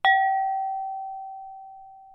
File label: success